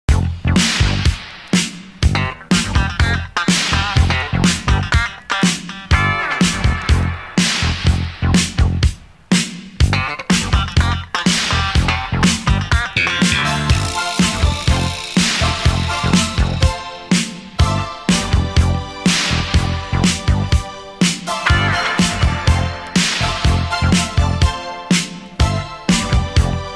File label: Funk, RARE FUNK